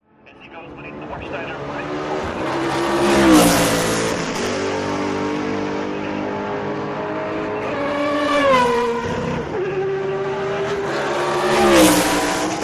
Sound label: Sports Car Race Pit